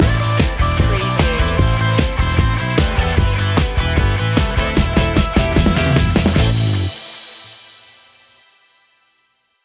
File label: stinger